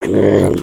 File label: Attack; Skullkin; creatures organic